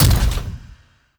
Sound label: construction step, strider; creatures; Half Life Model Pack